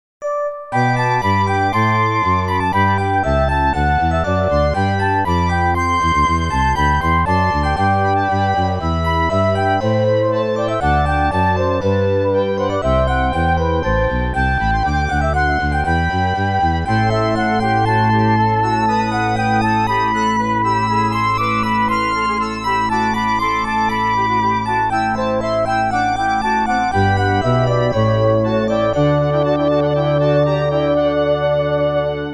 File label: MORNING MUSIC Loading; Gradius; KONAMI